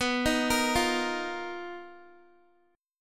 B Major 7th Flat 5th B Guitar; BM7b5; arpeggiated; x,14,15,15,17,x; 4F,4A,5C,5G,5C,6E

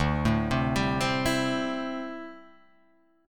D 7th Suspended 2nd Suspended 4th D

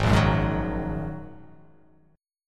G sharp 7th Suspended 2nd G sharp

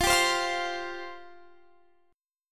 F Suspended 2nd F down Guitar Standard; strummed; capo 9; chordshape

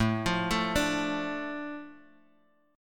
A Suspended 4th Sharp 5th, Asus4#5 chord; arpeggiated; 5,8,7,7,x,x; JGuitar

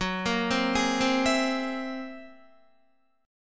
F sharp 9th Flat 5th, Guitar; arpeggiated; chordshape; 13,13,12,13,12,12

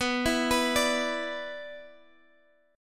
B-Minor Double Flat 5th B down Guitar Open F; Bmbb5 arpeggiated; JGuitar

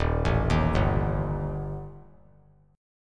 Eb Major Flat 5th Eb; EbMb5 arpeggiated; JGuitar

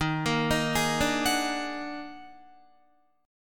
Eb Minor Major 11th, Guitar; arpeggiated; chord; 11,13,13,13,14,13; JGuitar

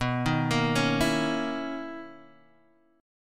B Major 11th B down Guitar Open G

